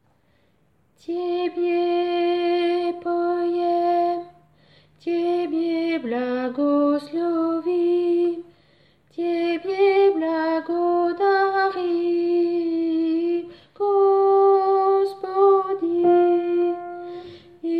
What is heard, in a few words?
alto; Tebe